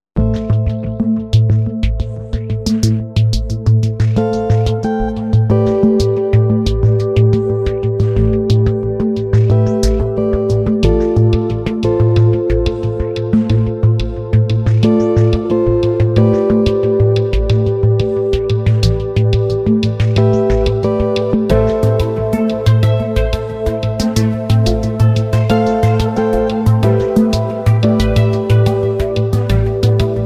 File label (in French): AMBIANCE LATEX